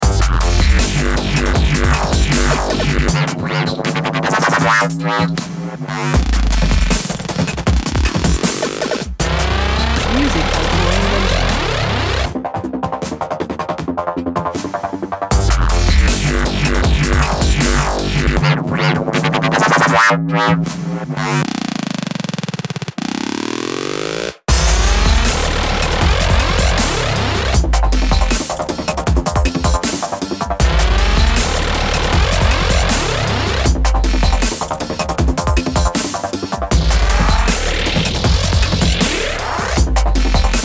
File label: Traffic